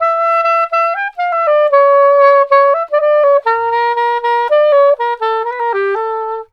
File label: SAX, Balkanish Traditions